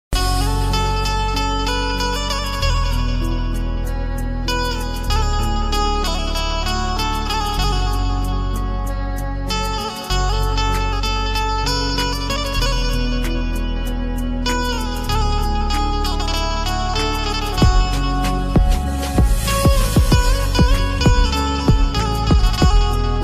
instrumental ringtone old is gold instrumental ringtone romantic ringtone